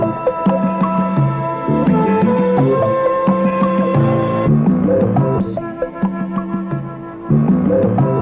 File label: keys; Loops